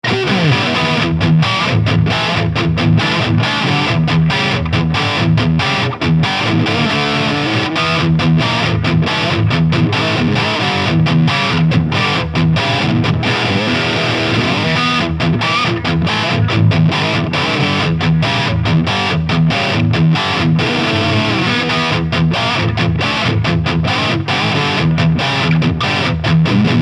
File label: Dean Guitars ML Oceanburst Lead